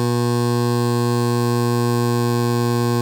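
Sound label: G Bass, bagpipe; Breadalbane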